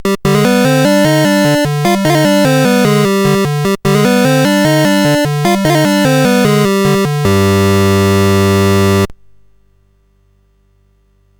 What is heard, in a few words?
Dragonworld sid CSG8580R5